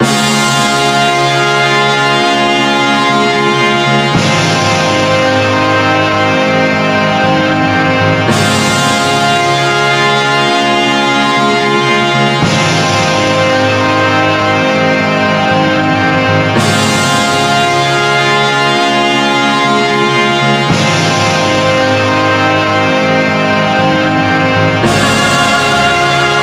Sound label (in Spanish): musica